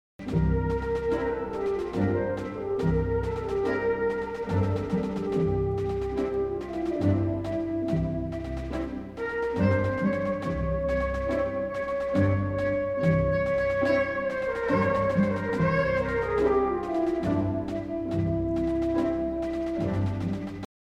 Sonido de glissando en saxofon; Instrumentos de viento; Viento-madera; Sonoridades especiales

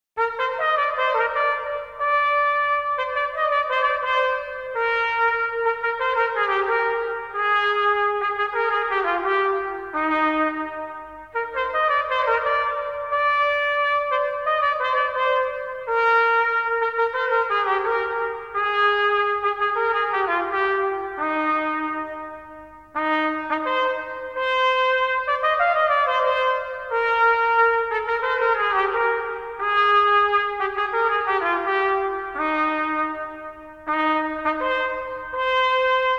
Sonido de trompeta; Instrumentos de viento; Viento-metal

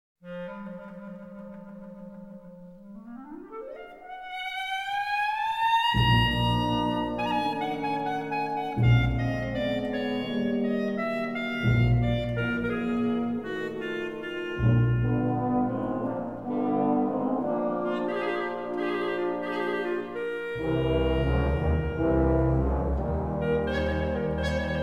Sonido de glissando en clarinete; Instrumentos de viento; Viento-madera; Sonoridades especiales

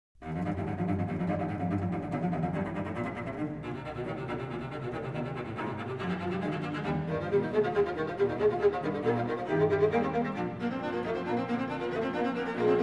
Octeto de cuerda; Agrupaciones de camara

